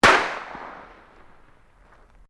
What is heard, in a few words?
Fireworks Skyrocket Bang, Domestic Fireworks; Fireworks (Sound Effects:Backgrounds)